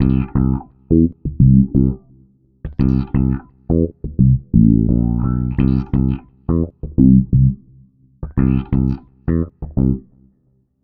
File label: Filtered Funky Bass, Electric Bass